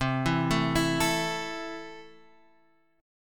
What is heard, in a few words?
C 6th C down Guitar Drop D